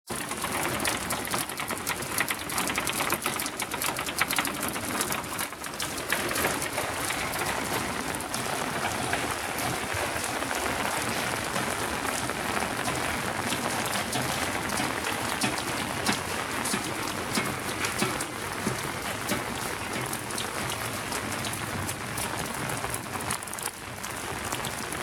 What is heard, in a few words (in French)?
pluie